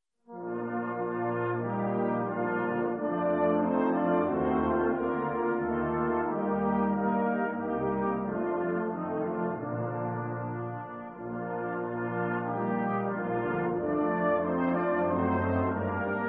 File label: Hymn Tune; Beecher